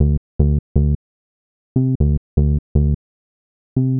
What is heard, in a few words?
Smooth Bass (120BPM), retro house